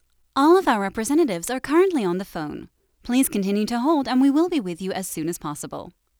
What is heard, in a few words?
Generic Queue Hold